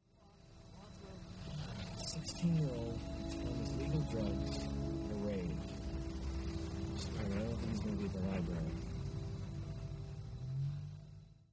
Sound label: euphoria chords; chords only; funerial minor chords